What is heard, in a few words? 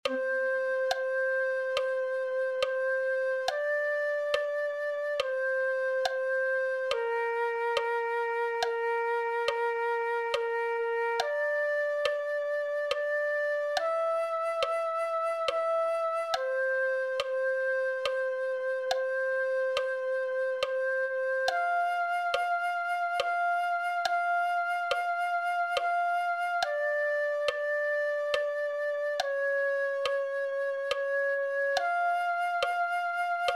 flute